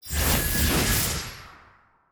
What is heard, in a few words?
Mage Skill